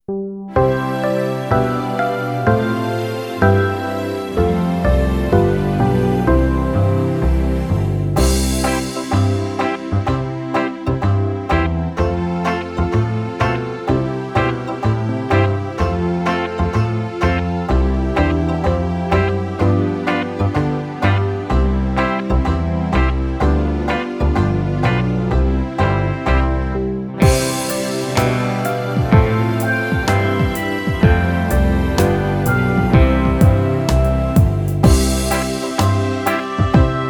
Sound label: Harmonica All my ducklings to play along, blues harmonica